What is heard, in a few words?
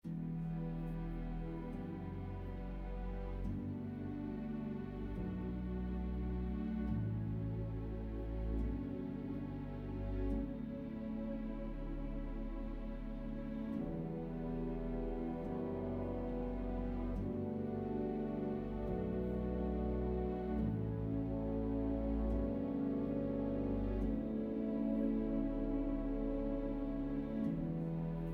calm, music; minecraft; TBMPack